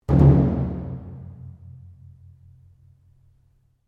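Drum Taiko Odaiko Double mezzo forte, Large Japanese Taiko Drum; Miscellaneous Musical Sound Effects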